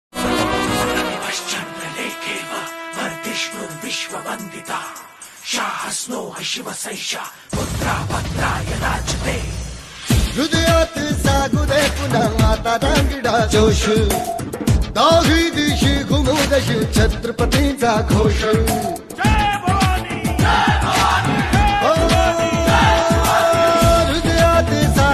bgm ringtone instrumental ringtone; chatrapati shivaji maharaj

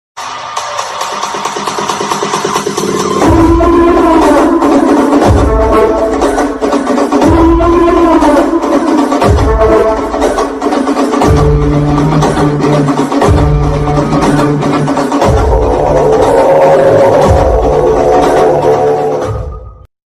villain bgm ringtone

